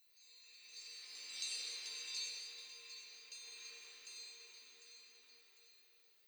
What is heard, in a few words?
loading